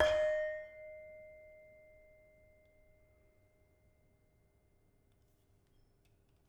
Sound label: Saron, gamelan